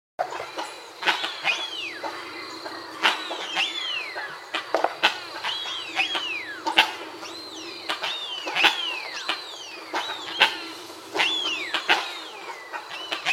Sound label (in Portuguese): Canto do mutum de bico amarelo